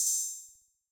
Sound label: Open Hat; Drum Kit; Attention; Cymatics; GHOST (Beta Pack)